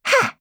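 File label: attack, Voice Line, Korean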